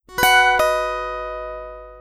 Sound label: wx alert